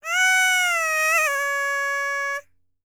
BULGARIAN, World Voices, BALKAN

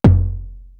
kick earth; East West; Global Sounds

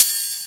Rides, Cymbals; Vengeance - Essential Clubsounds Vol.5

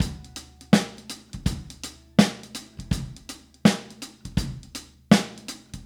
Soul Drums, 70s Analog Funk; Sonic Foundry (Sony Creative Software); What it Is!

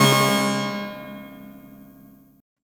HOE DOWN, SYNTH; Keith Emerson Signature Series

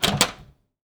Draw, household rhythm samples Hits

